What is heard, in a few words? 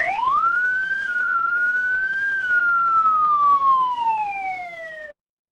mechanical siren